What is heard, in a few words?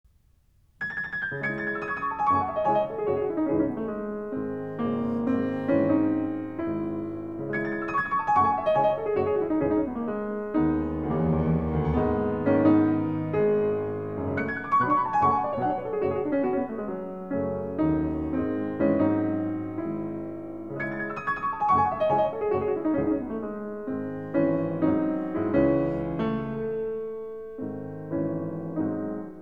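Preludes, In E Sharp Minor, piano; OP. 28 No. 10; Chopin; Claudio Arrau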